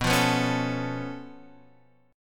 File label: C sharp Augmented 7th B, chord